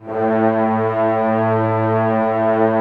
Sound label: orchestra A1 FF; Sound On Sound - Peter Siedleczek's Orchestra